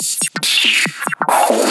Loops Fillin140; Fills; Vengeance Samples

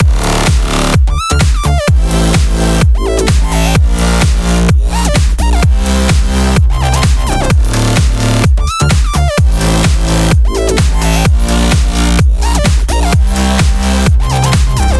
128BPM Mix; Vengeance Samples; Uprising Kit

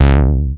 Bass Juno C#; Vengeance Samples

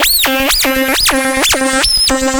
Roland C Line, Roland S100; musicradar-synth-samples

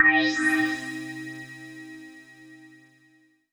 Tonal FX C

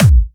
Bassdrums Clubby Kicks; Vengeance Samples

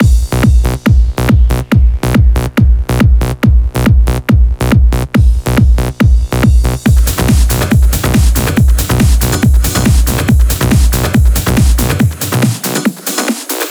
140BPM Mix; Genetic Mix

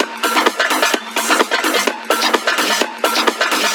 Latin House Loop 128 BPM; Vengeance Samples

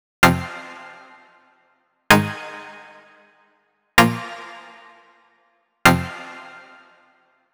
Guess What Chords Root F, 128BPM; Vengeance Samples; Guess What Kit

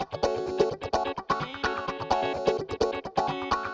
Electric Guitar - F# min, 128BPM; Vengeance Samples; VEH3 Electric Guitar Kit 2

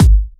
Bassdrums

